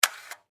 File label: reload